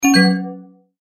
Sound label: multimedia tone error